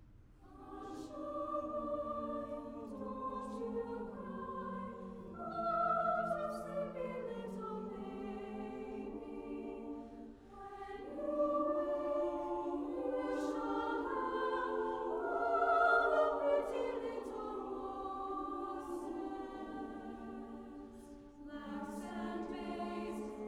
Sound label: Concert Choir; MIT Concert Choir